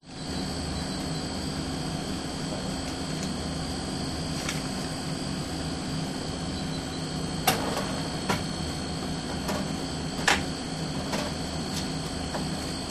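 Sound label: Convenience Store Ambience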